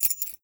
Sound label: jingle, metal keyfob; physics; props